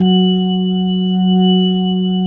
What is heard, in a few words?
F#3S, B3, Vintage Keyboards